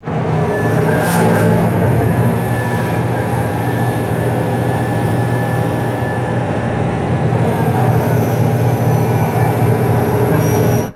short bursts of hydraulic motor whine; mechanical

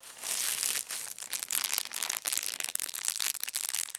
crinkling plastic bubble wrap movement

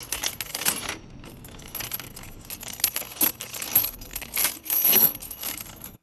heavy metal pieces clinking and rattling; chains

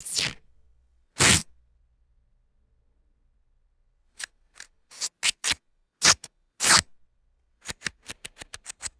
short rips of sticky gaffer tape

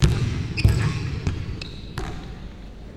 basketball hitting rim and bouncing indoors

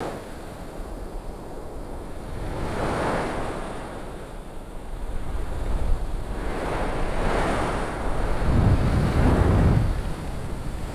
soft ethereal wind swell and whoosh

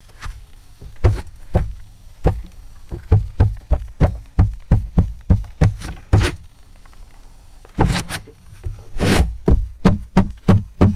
paddle knocking against canoe hull; boats

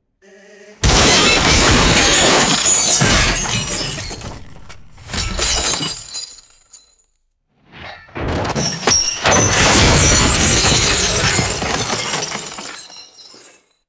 heavy metal debris and glass crashing

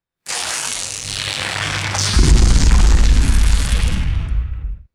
synthesized panning lightning strike zap; weather

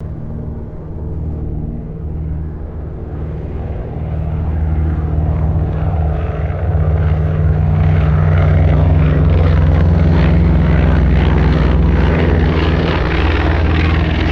distant propeller aircraft approaches and passes

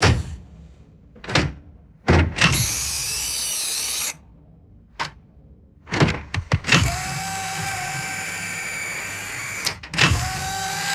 high pitched servo motor whine and clunks; motors

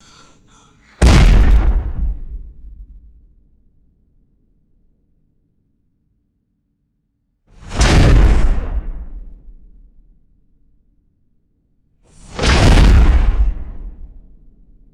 heavy explosive door slam impacts; explosions

